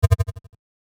Error; Noisecreations - ARCADIA

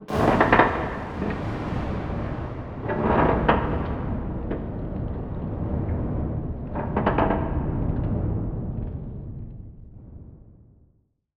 metal texture; Bluezone; Abandoned Labs Dark Cinematic Samples

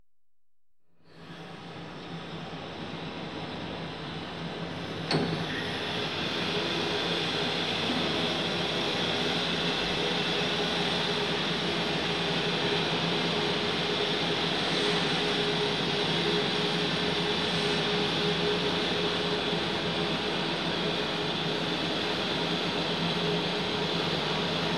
German U-Boat, Surfacing; Ships And Boats; BBC Sound Effects Library; Ships And Boats 1

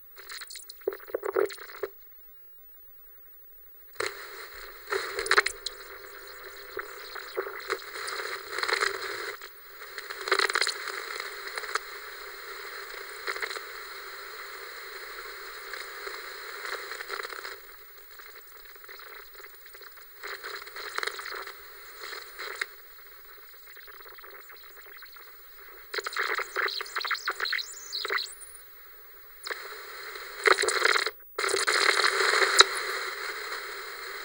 Analog TV Noise Modulation Glitch; The Soundcatcher

